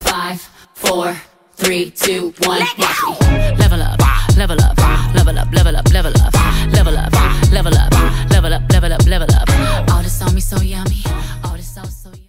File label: level up mix